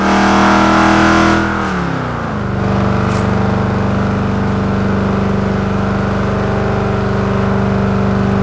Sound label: fourth cruise, vehicles; gmod; tdmcars; landrover